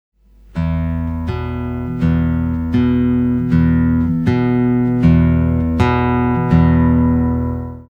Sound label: Thumb E and A, guitar